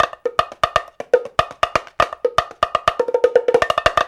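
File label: 120 bpm, Bongo Tonga; Spectrasonics; Supreme Beats; African Contemporary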